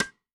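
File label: Side Rim, Snare; Yamaha DTXpress-II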